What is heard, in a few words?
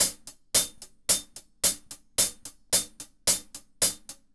Hi-Hat Groove; Hi-Hat Grooves; Sonic Foundry (Sony Creative Software); Vital Drums